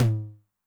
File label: LT MOD Noise High, 909mod LOW TOM; classic machines